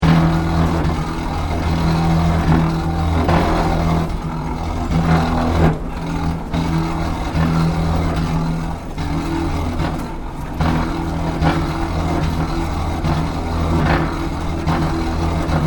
Industrial Travelator Airport Malfunctioning Loop Stereo, broken Airport travelator; Seoul; Machinery